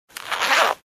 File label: Clothe Zipper Close